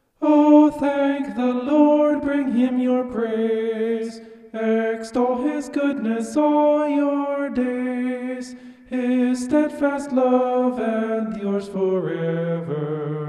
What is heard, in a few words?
vocal homoph; psalm 106